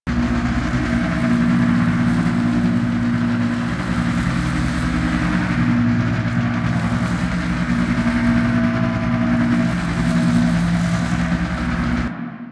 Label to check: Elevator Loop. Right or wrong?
Right